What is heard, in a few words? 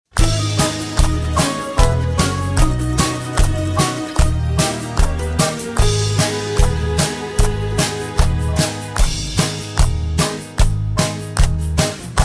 (Abm) No Backing Vocals